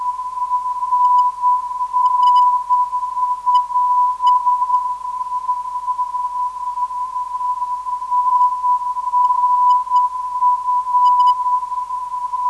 NRF 465kHz

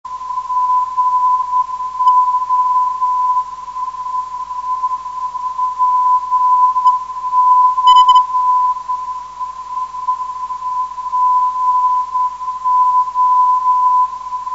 go 515khz, ndb

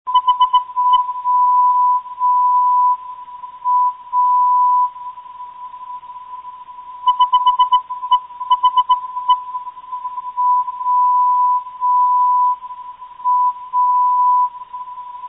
350khz, ndb

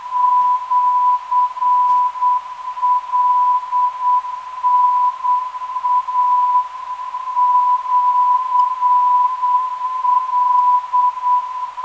LNA 313.98kHz

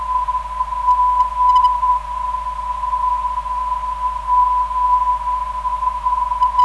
432kHz, ndb

